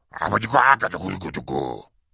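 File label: sounds of voice of caveman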